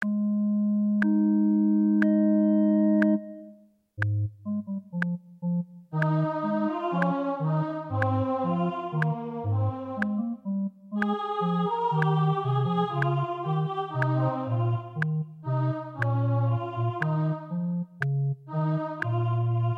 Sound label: O clap your hands; Gibbons